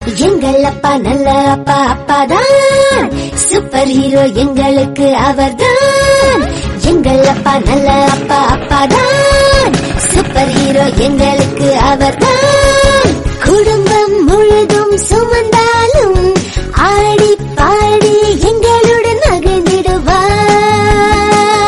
Fathers Day Song Tamil

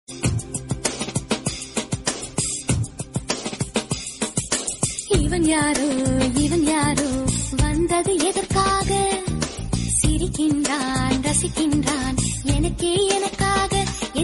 Love Album Songs Tamil; ringtone

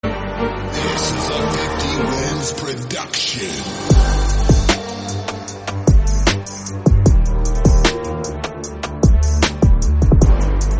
Instrumental Rap Beat; Grand Theft Auto

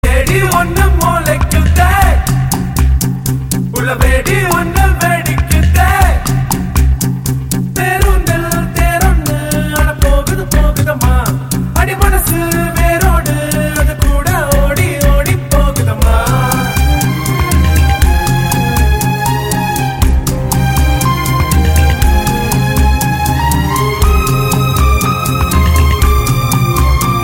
Ringtone

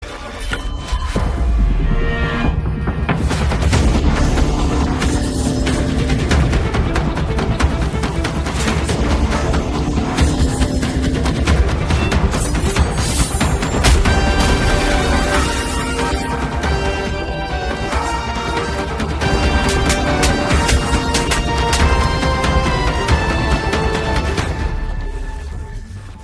Trailer BGM Ringtone; Siren; Jayam Ravi; Keerthy Suresh

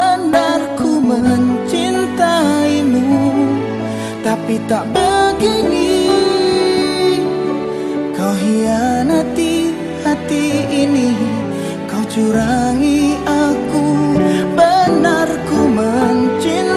Ringtone

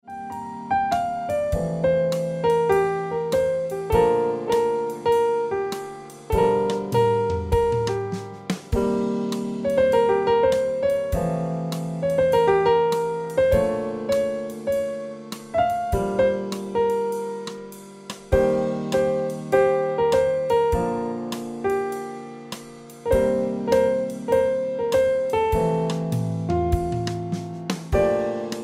Piano Music

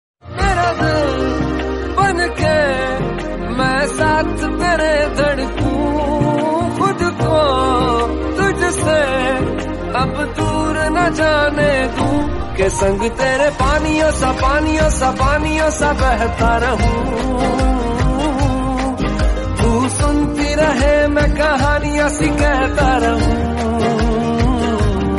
Hindi

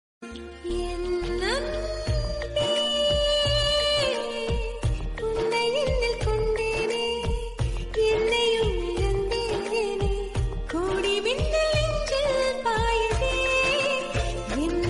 Romantic Tamil; En Anbe Serial

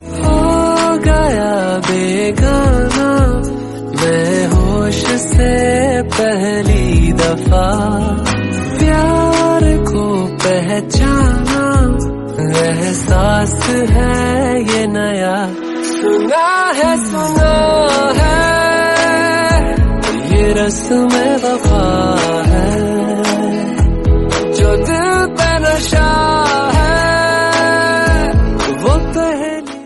Hindi Song Ringtone

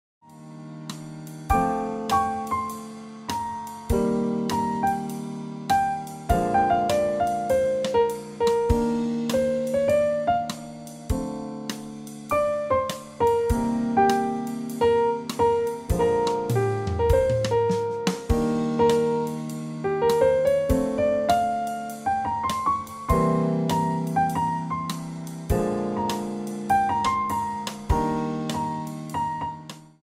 Piano Music

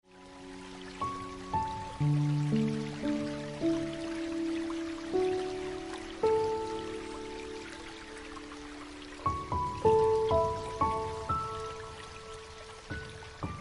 Piano Music

